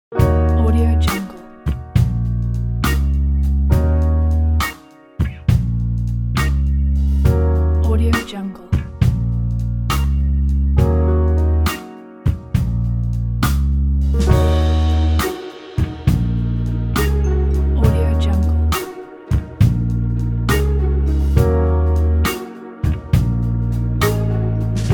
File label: blues